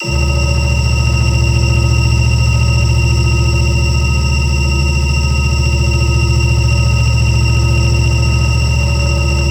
electric large, engines; acf engines

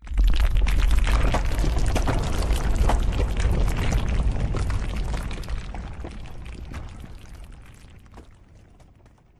apophis rumble

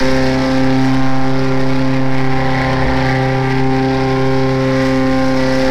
i4 petrol medium, engines; acf engines

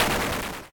panel weapon hit

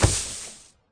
weed pack

